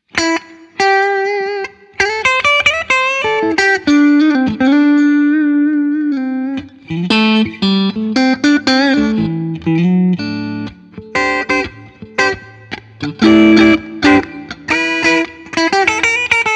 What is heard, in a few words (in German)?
Yamaha Pacifica Standard Plus clean Hals Mitte